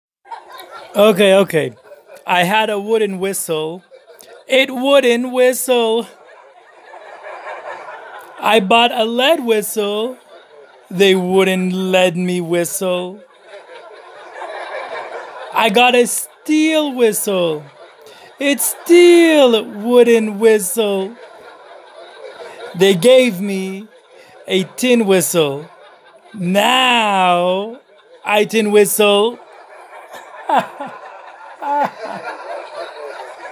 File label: wood whistle